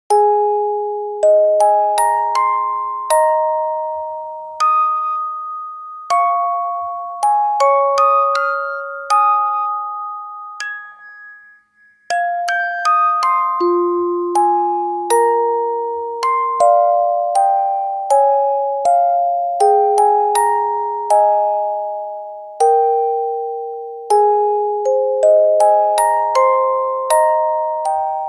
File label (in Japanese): egao hutatsu orgel